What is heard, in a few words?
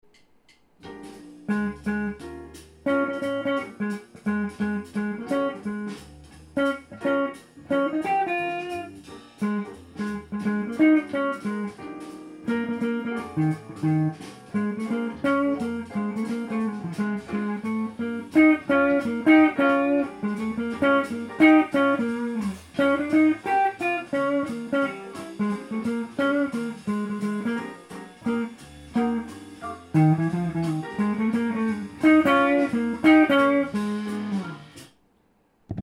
7th chord tone solo, guitar